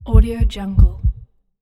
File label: heartbeat loop